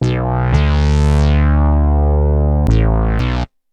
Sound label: Bass, Synth Bass Loops; Sonic Foundry (Sony Creative Software); Groove Elements; Basses